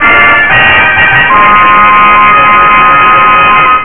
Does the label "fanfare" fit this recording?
Yes